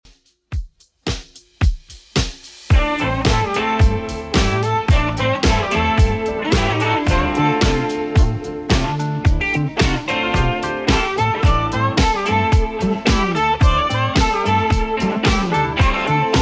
70s Redrum 110bpm

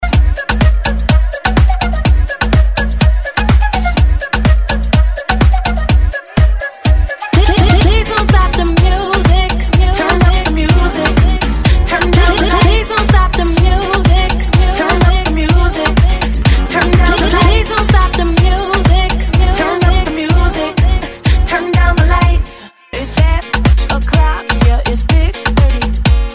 Hype Up Intro 125bpm

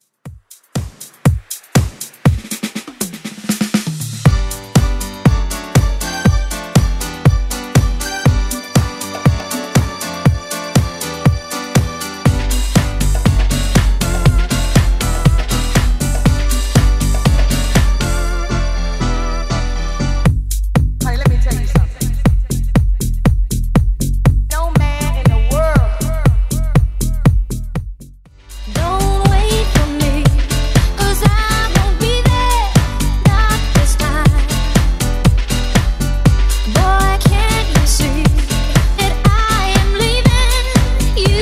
90s Redrum 120bpm

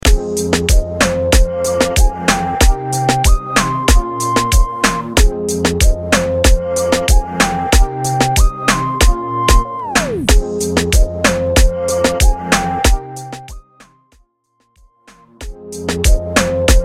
Reggaeton; Mainstream VIP

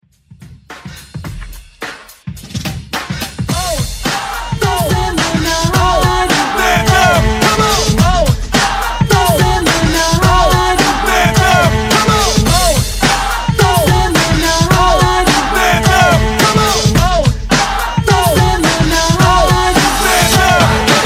Oldschool Break, 107 BPM

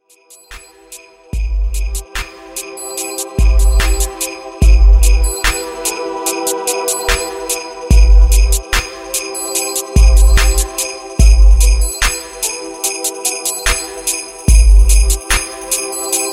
Xmas Hip Hop Trap Rework; Remixfreakz

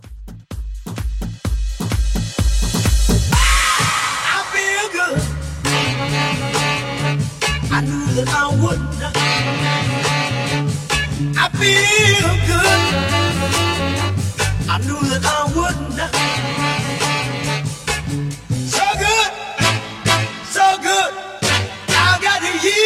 Party Starter 128

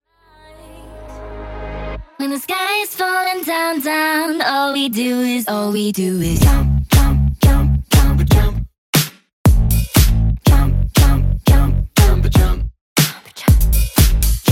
Wordplay Segue 120 Bpm; djyan

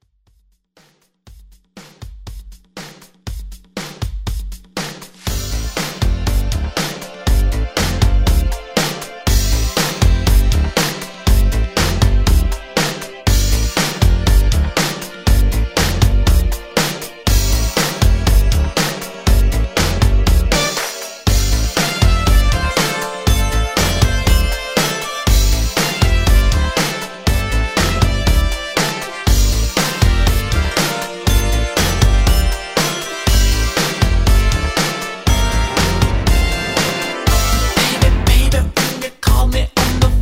80s Redrum

